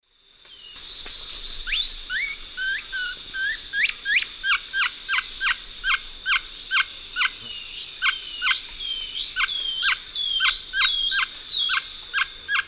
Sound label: Thamnomanes caesius, alarm call, S. America Eastern Lowlands